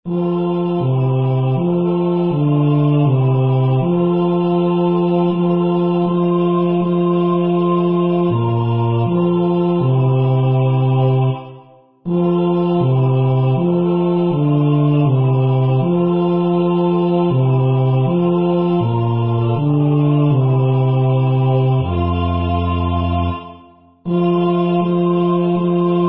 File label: Bass; Arcadelts Ave Maria